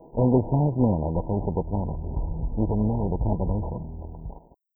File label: transitional